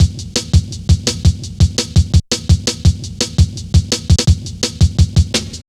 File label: Steppy, Jungle, Drum Loops 168.5; Zero-G Jungle Warfare 1, 2 & 3